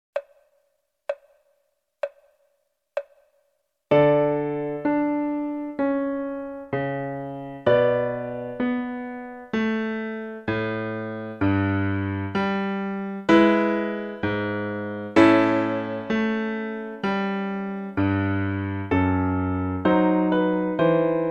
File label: air sul g d-dur; bach